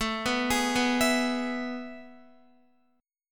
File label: A Major 7th Suspended 2nd, Guitar; arpeggiated